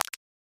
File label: produce a satisfying lava, gameplay